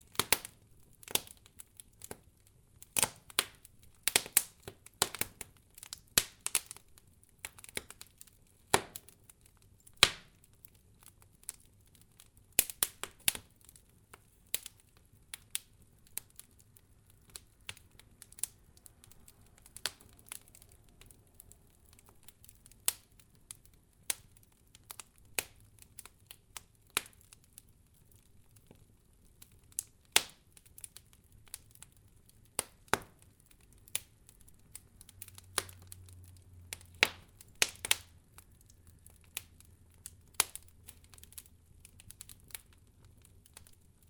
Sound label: Fireplace Ambience